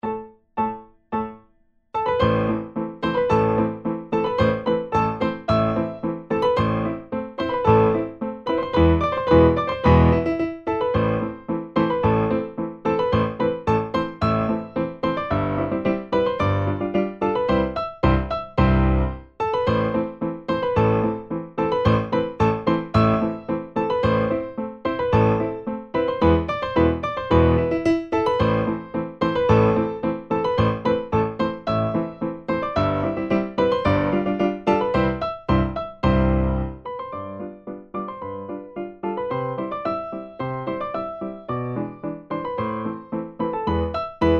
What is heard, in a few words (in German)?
beide, Vierhaendige Uebungsstuecke; Playbacks; Diabelli